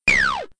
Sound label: shot